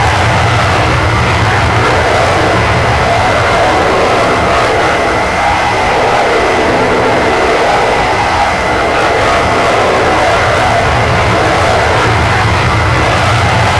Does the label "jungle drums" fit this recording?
No